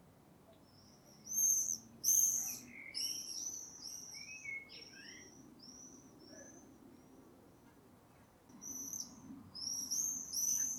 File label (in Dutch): Geluid Gierzwaluw